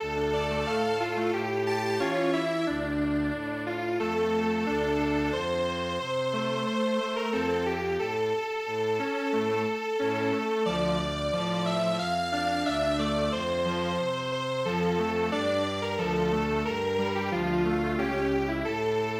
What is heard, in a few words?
Strings Quartet, midi traditional